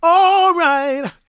GOSPEL MALE; Spectrasonics Vocal Planet

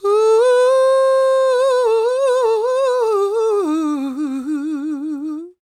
CROON, Soul; Spectrasonics; Vocal Planet; Blues; CW SOUL

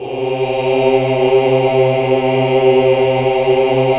OOH. OOH UNI; Hallelujah

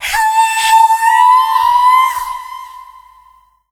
Dance Vocals, FEMALE

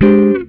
GTR CHORD; Univers Sons; Jazzistic

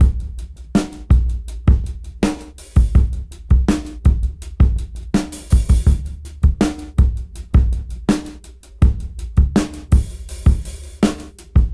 82 BPM; AMG; Keith LeBlanc Phat Butt Jams

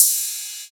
Ride, Rides; Electronisounds

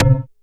WEIRD HIT; E-MU Formula 4000 Series Vol. 2

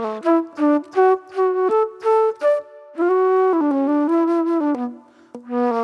mellow soul flute; Sonic Foundry (Sony Creative Software); Manhattan Flutes; Soul 1

